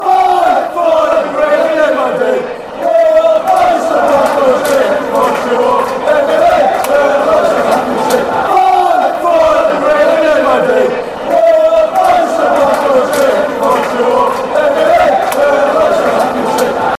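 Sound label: black country, football chants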